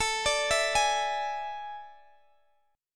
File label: A Major 7th Suspended 4th